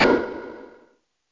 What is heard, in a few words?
AIR POP C3; ensoniq; sq80